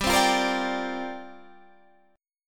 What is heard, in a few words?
C 7th Suspended 2nd Suspended 4th, G